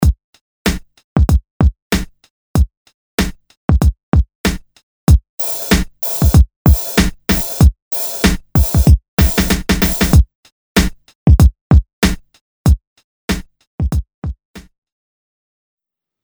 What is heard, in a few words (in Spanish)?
instrumentos electronicos